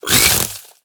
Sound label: Origami Frog Attack